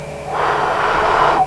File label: blow, People; Sound Library 2000; Wayzata Technology